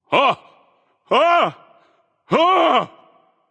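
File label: nonverbal fear; smite; voicepack; merlin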